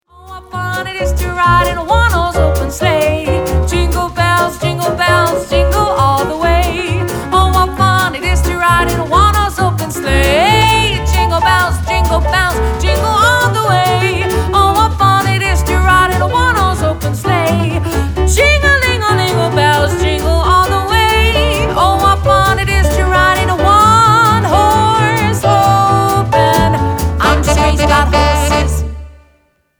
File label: Jingle Bells